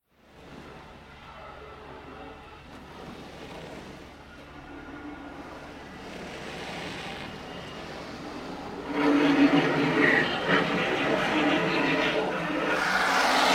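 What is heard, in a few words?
Water Spray Interior Car Hood Up Long; Car Wash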